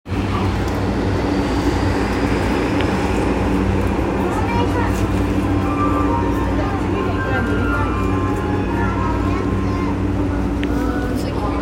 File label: train sounds; 半蔵門線青山一丁目西